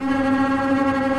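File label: bass tremolo C#4; Digital Sound Factory Virtuoso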